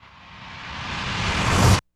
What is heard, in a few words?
IMP RUSH, Cinematic Impact; M-Audio Pro Sessions; Liquid Cinema